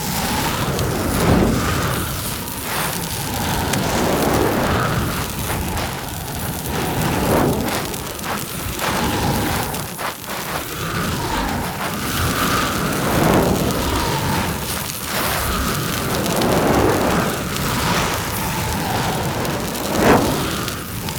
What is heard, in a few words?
Flame Thrower Loop; Drill Sgt